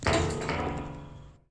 Spring Wobble; Lethal Company

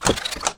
Extension Ladder Shrink; Lethal Company

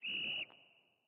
Whistle

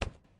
place rug

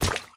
hit water

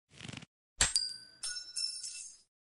Flashbang Pull Pin

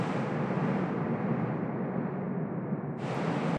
Falling Air

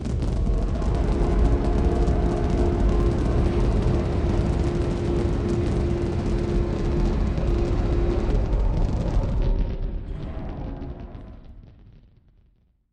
ship thruster ambiance

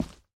Gravel; Lethal Company

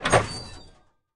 Door Open; Lethal Company

